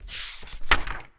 Pop Quiz Page

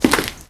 Concrete footstep; Starbites; PS5, Steam, Switch, Switch 2, Windows, Xbox Series X|S

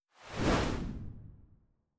Hawk Take Flight

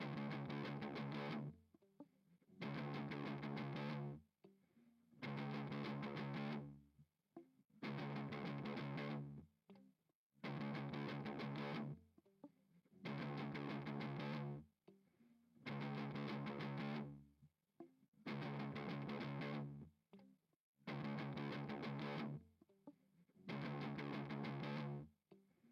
GTR Sum; GTR 2

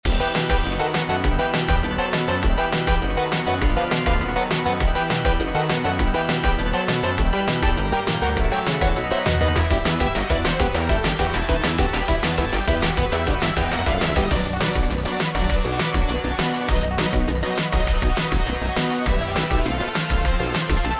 trap room; batbarian testament of the primordials

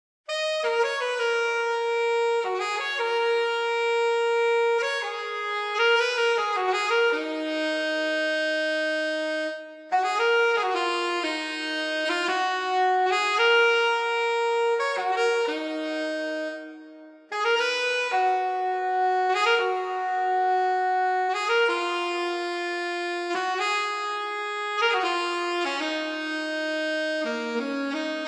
Sax - 115bpm

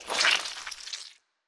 Plague Pesti Spray; Legend of Keepers

